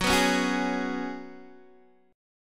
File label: F sharp Suspended 2nd Suspended 4th, Guitar, DADGAD